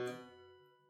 Harpsichord stop1 release B1, Harpsichord, Italian; Chordophones; Releases